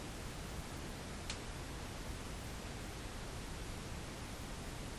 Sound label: Tattoo Shop Static